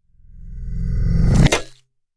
Science Fiction Magnet Attract Metal Impact Super Power; Electrical; SFX Bible